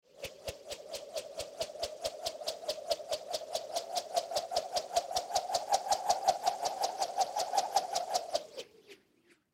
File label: Wire Spin Overhead, Wire Spin Overhead Whoosh; Spinning (Sound Effects:Objects); Blastwave; Foley